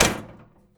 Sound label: metal button press